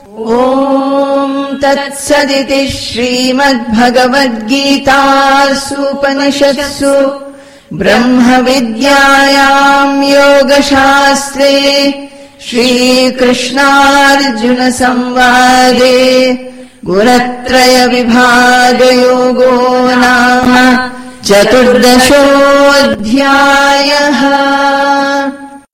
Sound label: Ending Chanting; chapter 14 guna traya vibhaga yoga; bhagavad gita; conclusion